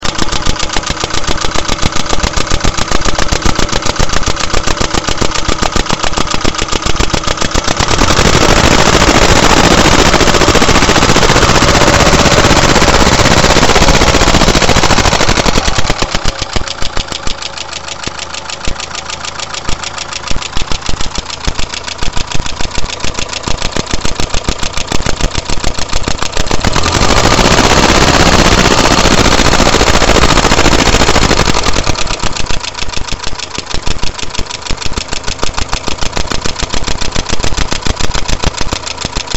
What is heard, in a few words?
front rear engine, funny knocking sound